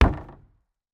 bridge, stomp, small, flat, wood, gully, streamlet, dry, ruralpark, strong, rattle, gritty; Eiravaein Sound; Gravitas